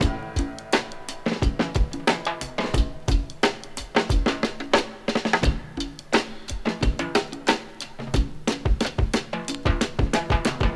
89 bpm fresh drum loop G sharp key; drum loops

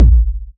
tight kick drum sample a sharp key; Duration 0:01; Bitdepth 24; Channels Mono; kick drum samples

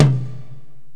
roomy tom drum c sharp key

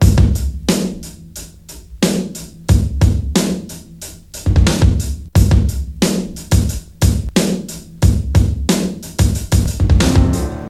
90 bpm hip hop drum beat c key

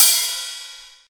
long room reverb cymbal sample g sharp key

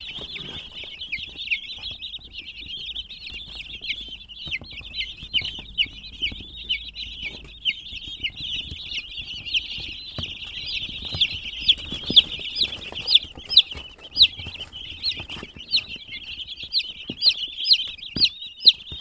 baby chicks chirping sounds; sound effects

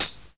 raw closed high hat sound d sharp

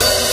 sharp open hi hat sound g key; Duration 0:01; Samplerate 44100 Hz; open hat samples

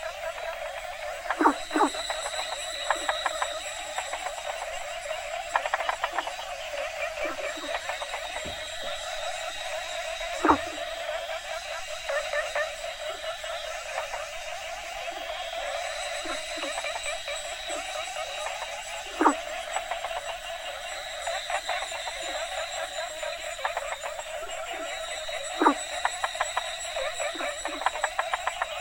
asian frogs variety

